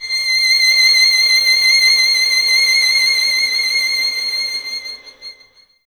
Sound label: violin, Solo Instruments; Miroslav Vitous; Solo Instruments VOL-2; Solo lnst. II